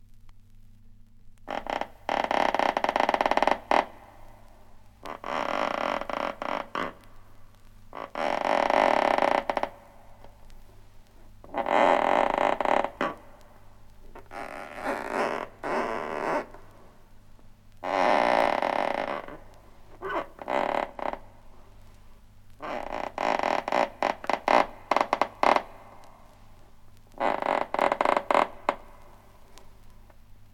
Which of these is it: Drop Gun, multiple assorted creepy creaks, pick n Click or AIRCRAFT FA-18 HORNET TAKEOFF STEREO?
multiple assorted creepy creaks